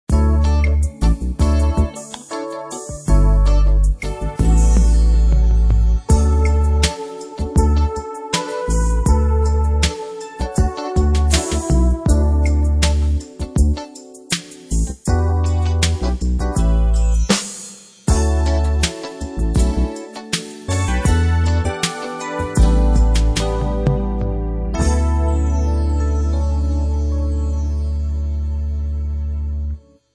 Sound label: 16 beat soul